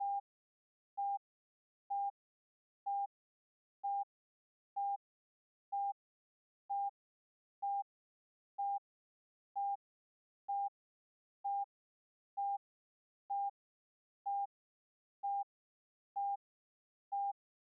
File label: Heart Monitor Running Speeding Up Flatlining